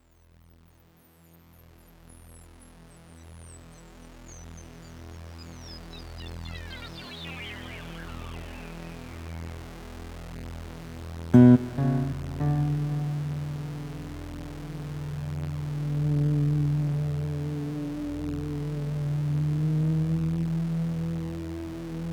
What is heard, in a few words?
solo with wave